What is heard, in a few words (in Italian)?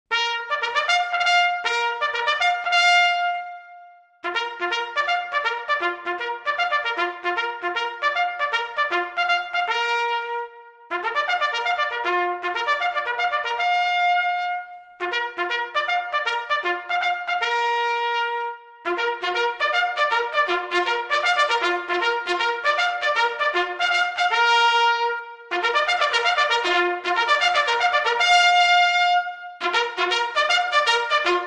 Sveglia Militare